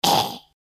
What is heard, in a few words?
Female zombie attack